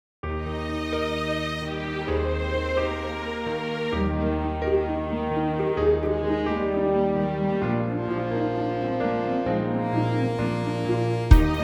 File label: Sonic Branding Jingle; Chula